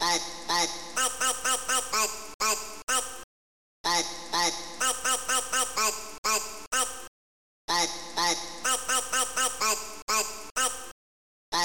FROG SONG; The Best of Select: Multimedia 15; Loop Protracker

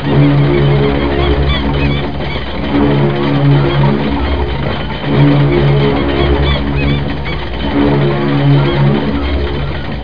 Lock-Seagulls-Ocean Blast; Museum of Anything Goes

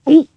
ehgulp

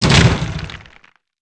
rock hit; pcdemo

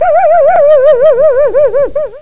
Laff; GEOGRAFIA

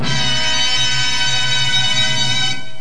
TRUMP; Groovy Bytes: Behind the Moon

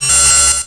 Warn Hi-Tech

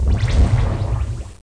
Screen Off; Professor Fernleaf from the Tropics

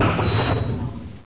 grenade; machines

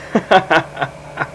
laughter; Easy Personal Web Page

